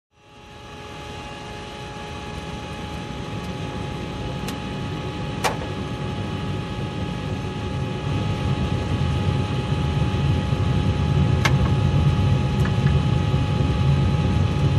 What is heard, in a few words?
Aircraft Cabin Crew doing something